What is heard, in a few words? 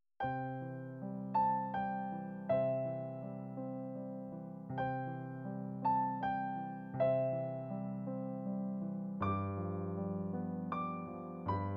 SILENT NIGTH DUET